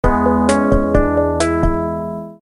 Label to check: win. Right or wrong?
Right